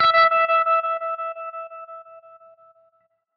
class menu, music; Garry's Mod; tf2

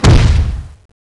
footstep; zg titan

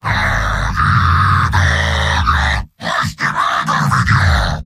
singing; heavy; mvm

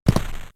walk step, footsteps; vinagre npcpack

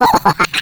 skelly medium; Garry's Mod; tf2; halloween; skeletons

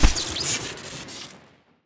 mittens punch crit; tf2

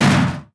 dog door hit ground; Garry's Mod

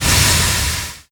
plumes short, halloween; Garry's Mod; tf2

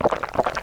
scout dodge can drink fast; Garry's Mod; tf2

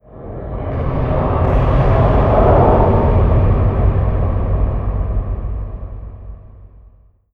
spacebase rumble; ambient; Garry's Mod; tf2

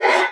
throwable grunt; weapons; breadmonster

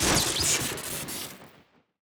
fist swing crit; tf2

